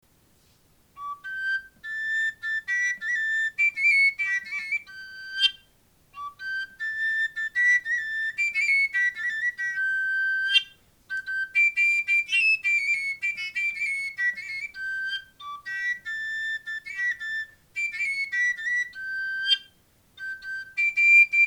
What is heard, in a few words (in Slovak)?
koncovka G